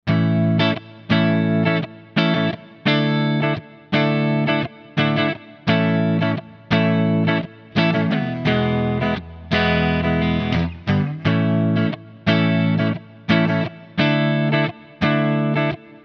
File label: Epiphone Modern Les Paul Neck clean